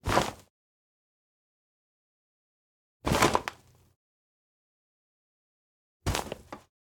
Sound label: retro vacuum cleaner foley grabbing bag; sonniss gdc